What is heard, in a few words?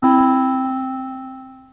green buzz; japan